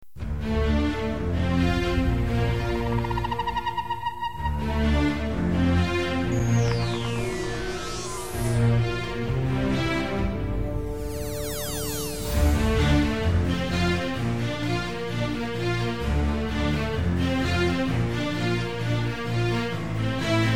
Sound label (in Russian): prosto pozitivnaya muzyka